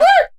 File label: VOCAL LINES; Best Service; World Colours